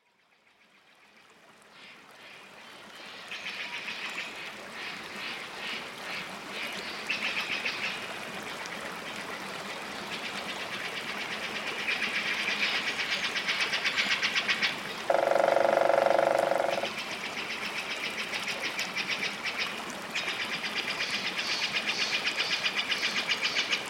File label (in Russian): zvuki prirody penie ptic i shum vodopada